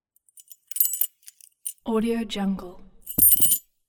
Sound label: key drop carpet